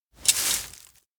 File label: receive bag tongs; anemoi